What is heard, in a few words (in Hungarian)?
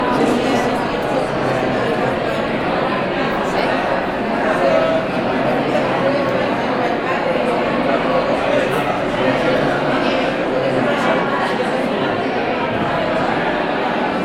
mórpalota zsivaj